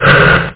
launch; Amiga Games 1996 #7; angst

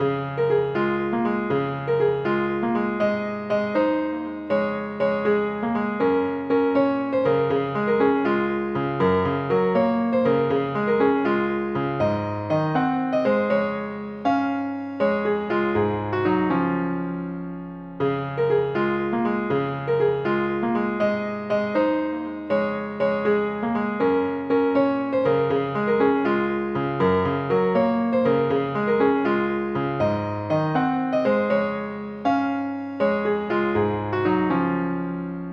PINE RAG

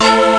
synth pan flute, chords

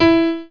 E4; Oasis demo

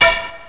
rock; Thief

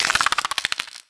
scorpian hit; DAoC

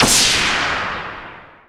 shot; Phoenix

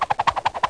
ERASE

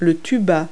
Tuba; Multi-Lingual Talking Picture Dictionary

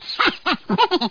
BONUS SUCCESS; gubble

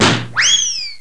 Impact; blackhol

